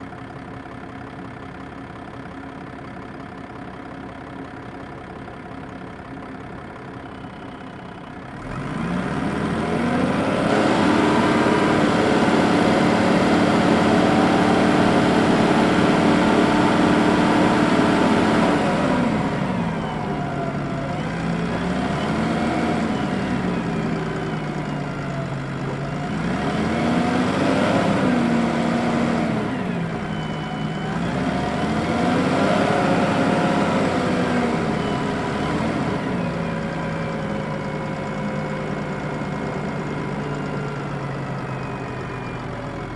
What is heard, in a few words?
volvo l90 wheel loader onbrd drive gearshifts stop off engine; Sonniss GDC